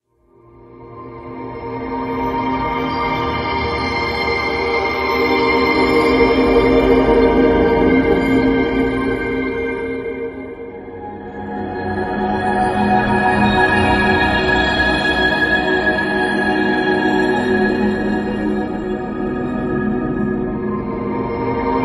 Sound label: Home Pad; OMS Sound